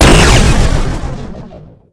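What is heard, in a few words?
railgun fire; quake2; coop; weapons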